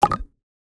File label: Craft Air Bubble Suction Pop Cork Water UI; User Interface